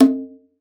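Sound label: FINGER BONGOS; Xsample VOL-9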